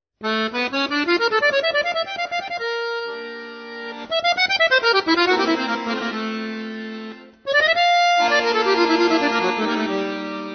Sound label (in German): solo walzer